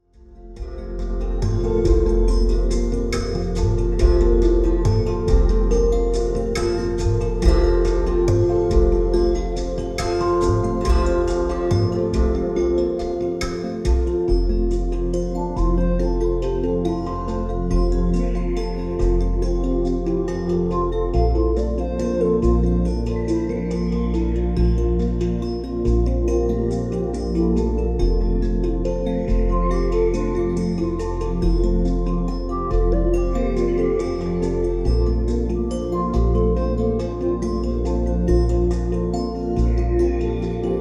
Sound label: Meditation 3D RELAX